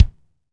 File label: kick, lite jazz, Roland